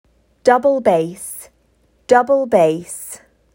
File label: Double bass